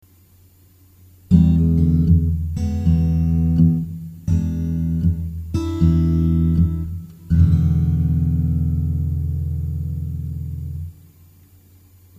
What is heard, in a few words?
mono B3